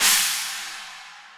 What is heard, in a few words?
crash